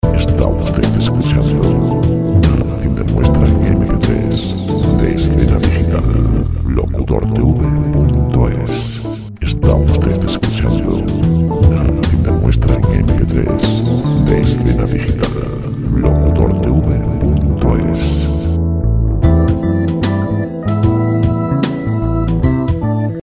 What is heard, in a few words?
jazz; Jazz Free music